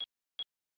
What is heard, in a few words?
time bomb tick loop